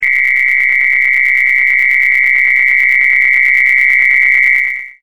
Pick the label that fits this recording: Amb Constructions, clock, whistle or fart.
whistle